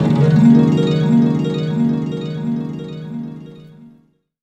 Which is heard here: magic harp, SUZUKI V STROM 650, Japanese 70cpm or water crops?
magic harp